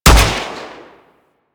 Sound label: Fire, Weapons; Gorgon; Warframe